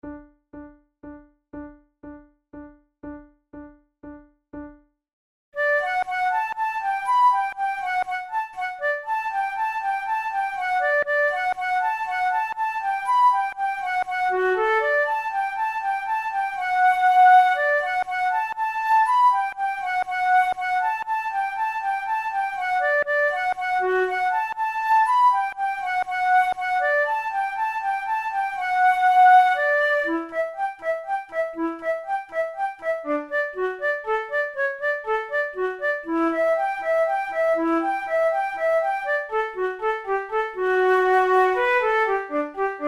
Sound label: waltz duet